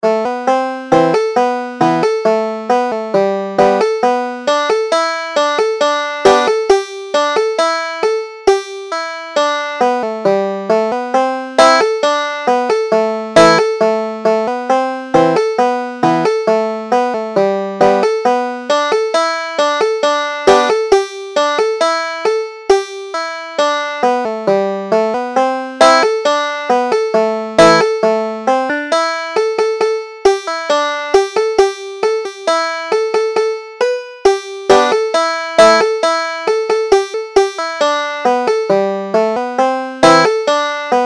ballydesmond polka, banjo

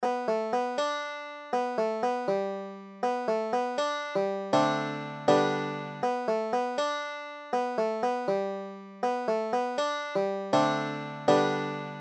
banjo

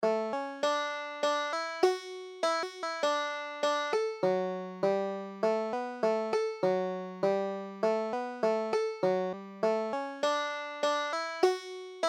banjo

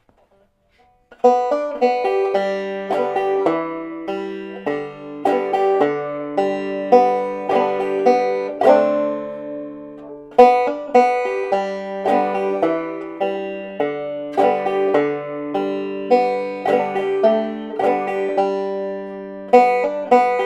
shove the pigs foot, banjo

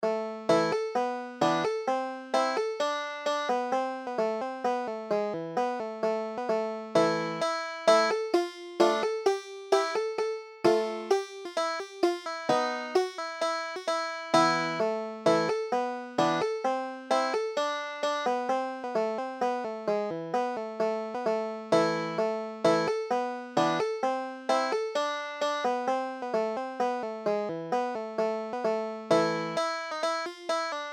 hungarian czardas, banjo

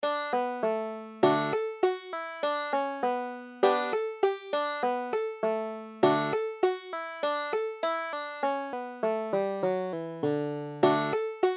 rocky mountain goat, banjo

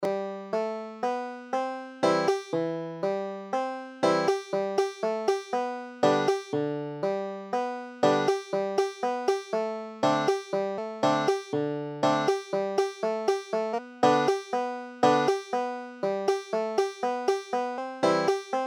banjo

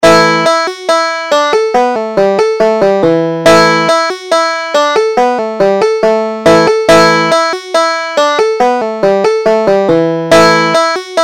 hogs in the cornfield, banjo

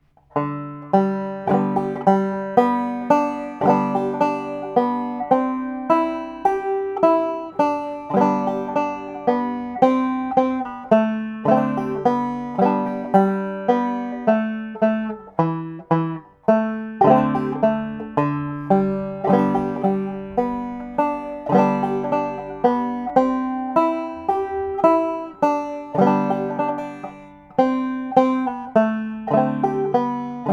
redwing, banjo

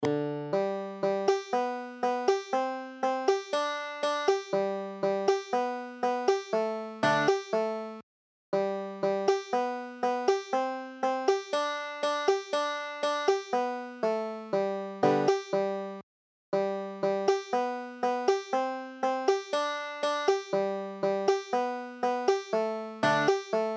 banjo

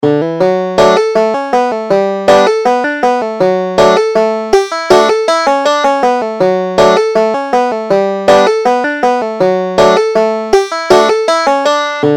dogs in the dishes, banjo

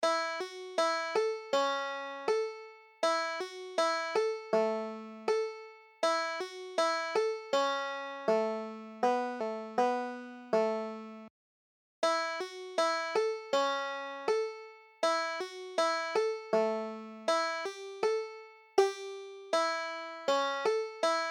breaking up christmas, banjo

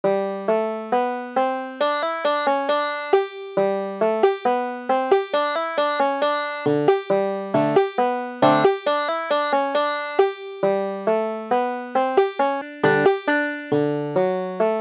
kazatshak, banjo

